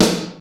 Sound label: SNARE, DrumBase5000; Crypton; CFZ KIT 2